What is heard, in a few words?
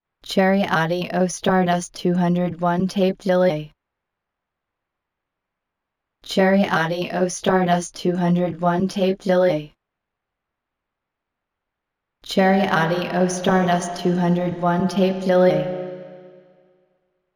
TAPE ECHO Vox; CHERRY AUDIO STARDUST 201